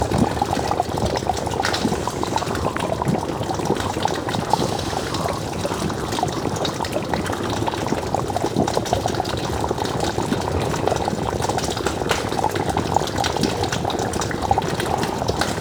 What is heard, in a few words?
Vial Hazard Poison Splashed Loop; Entrati; Hazards